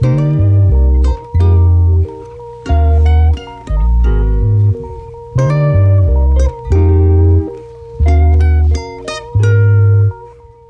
guitar

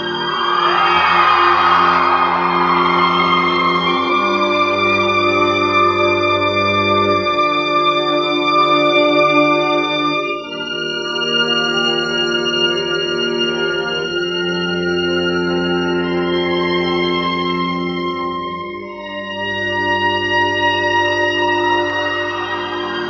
strings, Sad

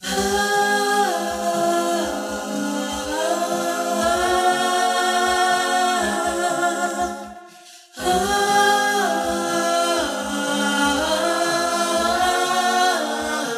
voice; Loops; Phonky

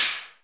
Electro Closed Hats

